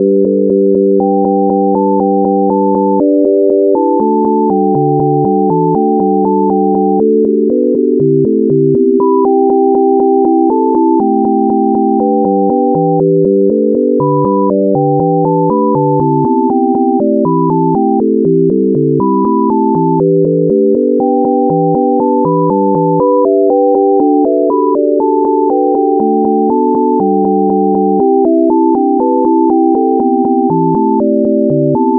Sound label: sine major pentatonic; Major Pentatonic Scale; music generation library in Rust